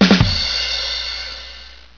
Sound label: rimshot, sports organ music and sound effects